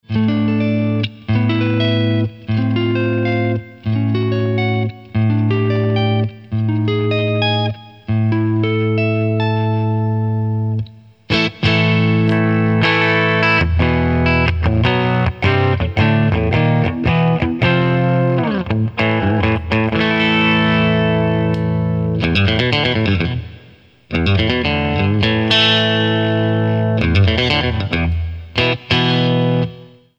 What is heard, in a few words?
Clean, Cyber Deluxe